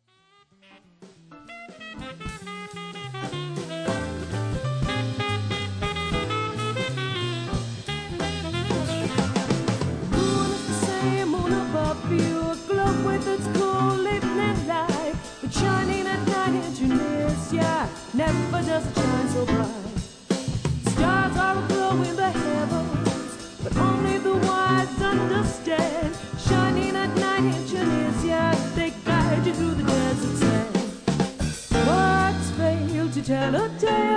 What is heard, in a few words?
Partido Alto